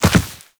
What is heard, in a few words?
Land Soft Dirt, Footsteps; Warframe; Characters; Operator